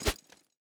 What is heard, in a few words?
fist swing; physics